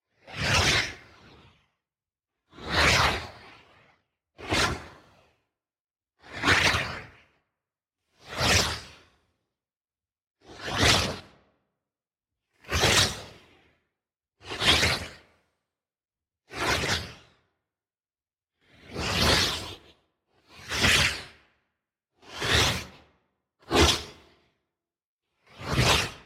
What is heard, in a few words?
Magic Fire Spell Movement